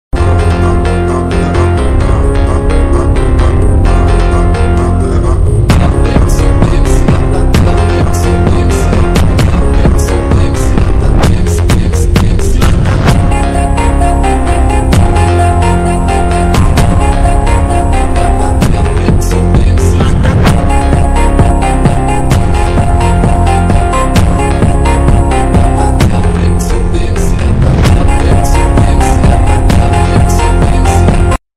Ringtone, Instagram Trending Song

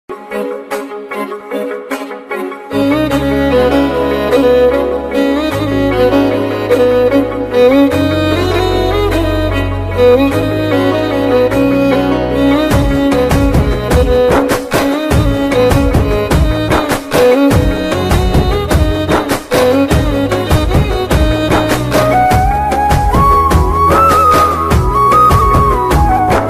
love failure bgm ringtone telugu song bgm

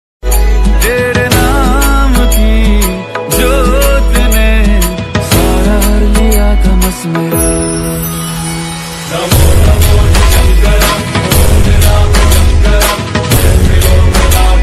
dj remix; mahadev ringtone; mahakal ringtone; bholenath ringtone; lord shiva ringtone

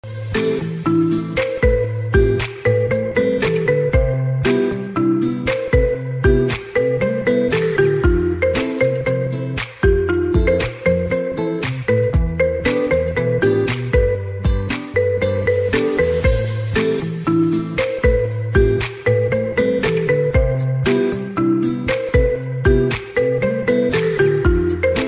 instrumental ringtone slowed reverb lofi tune; hollywood

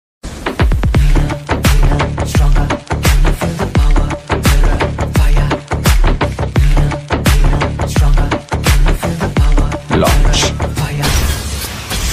trailer bgm ringtone; beast

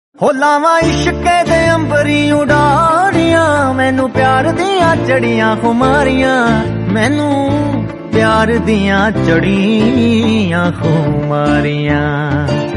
punjabi ringtone beautiful bgm love ringtone

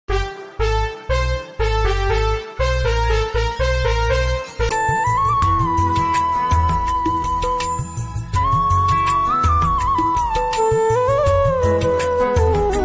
ringtone instrumental; hum tum; rani mukherjee; saif ali khan

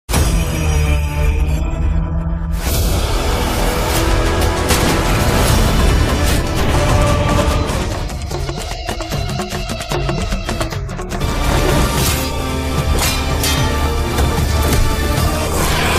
trailer bgm; tovino thomas

